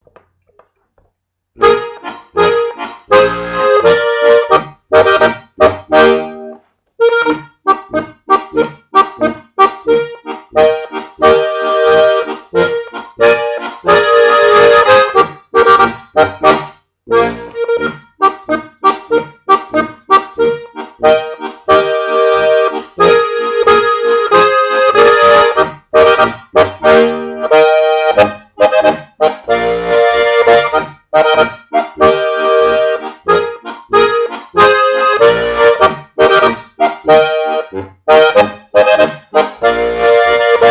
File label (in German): Schottisch Tanz; volkstaenze aus dem salzburgerland